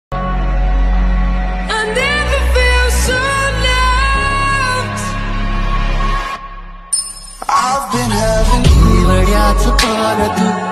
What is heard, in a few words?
english remix ringtone